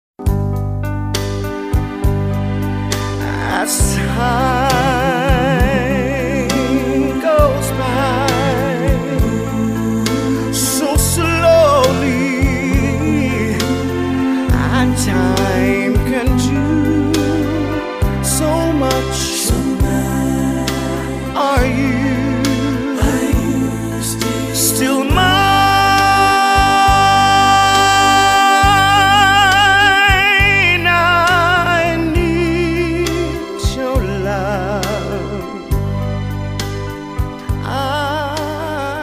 Party Band

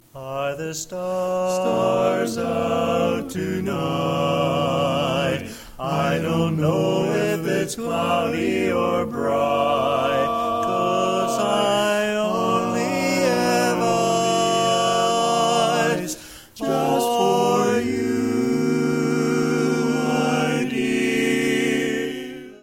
Barbershop Quartet; Toronto

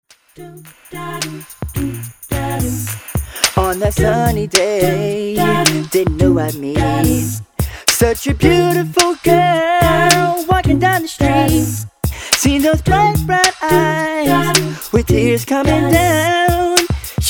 Acappella Group; Washington DC

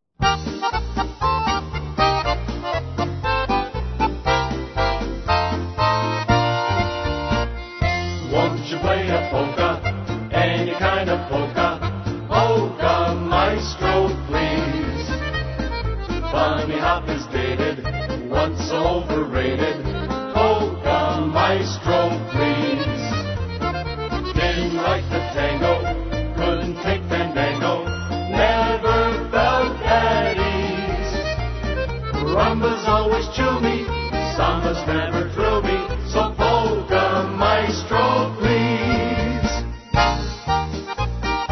Polka Band; Minneapolis

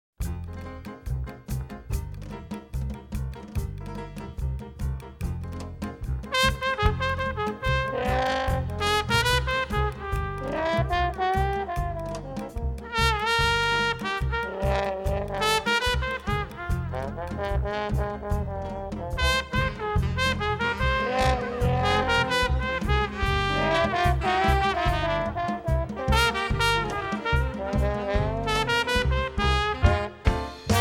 Dixieland Band

